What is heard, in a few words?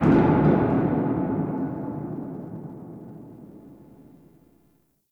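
Ocean Distant Bang; Ambience; Warframe; Grineer Ocean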